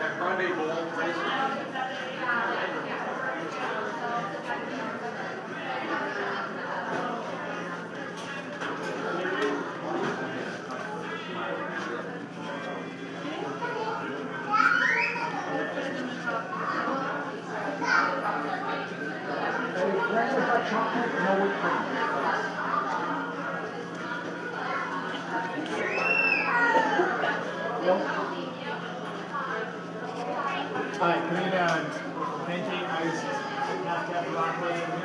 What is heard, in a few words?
sounds of starbucks scottsdale